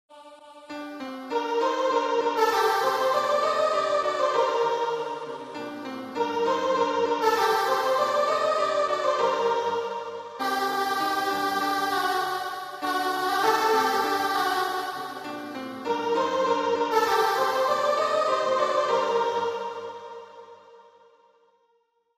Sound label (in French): c'était la veille de noël tenor